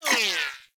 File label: Laser Whizzby; Warframe; Bullet Impacts; Whizzbys